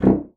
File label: C1, bassoon; Xsample VOL-6